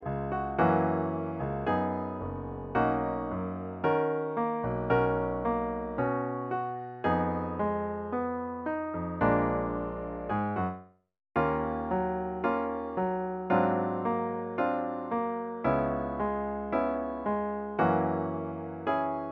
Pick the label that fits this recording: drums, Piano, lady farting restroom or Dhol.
Piano